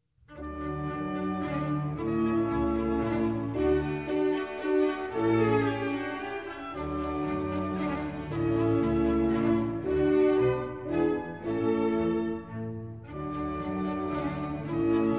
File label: Minuets, D major; Mozart; Dances and Marches